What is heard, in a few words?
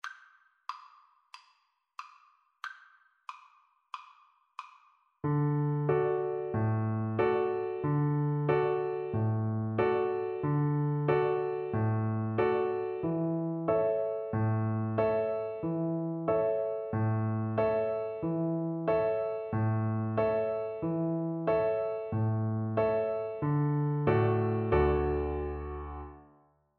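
VLC karaoke, Cello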